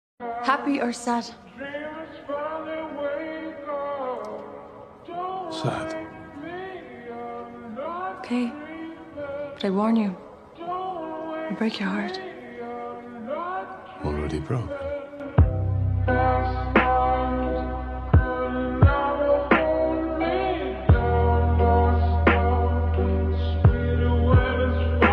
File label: sad, slowed reverb